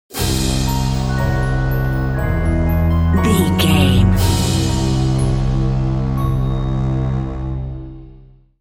trapped dream stinger

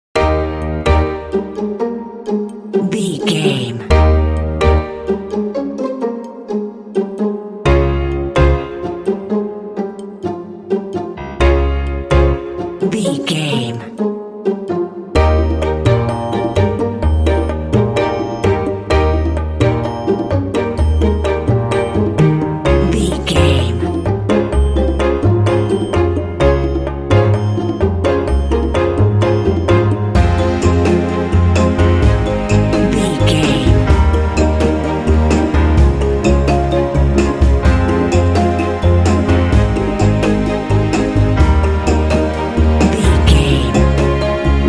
curious quirky dramedy underscore

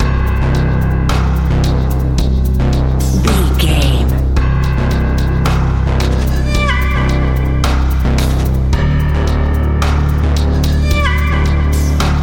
ominous scary action adventure

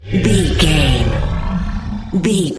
large monster roars

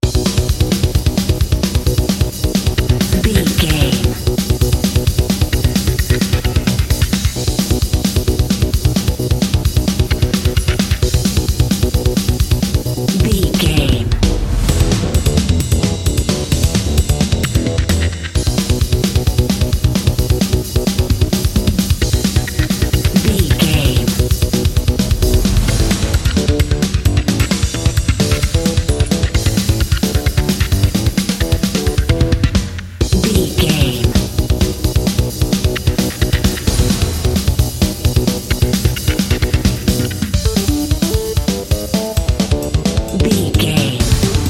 extreme racing video game level dnb

